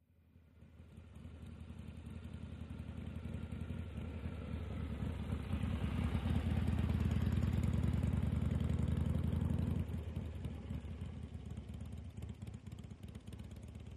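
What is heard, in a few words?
Motorcycle Harley By Very; harley-davidson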